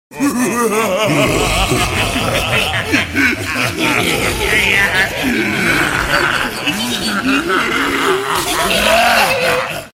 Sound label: ghost laughing ringtone